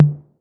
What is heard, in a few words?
TOMS 808